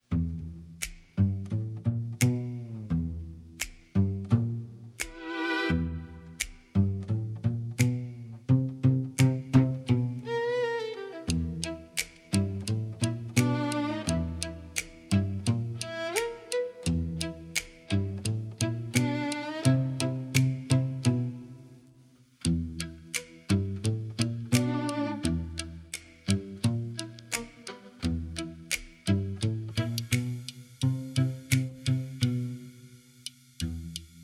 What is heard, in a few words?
Instrumental; Cheshire; CHESHIRE CAT